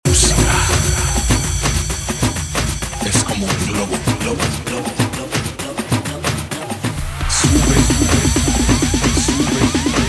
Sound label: guaracha remix 130 bpm